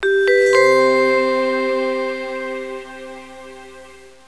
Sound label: open; Quicken